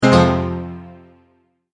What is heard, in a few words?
multimedia tone error